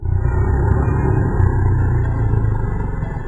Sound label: Spawn